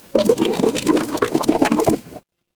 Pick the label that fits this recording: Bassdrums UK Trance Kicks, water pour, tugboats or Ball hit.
water pour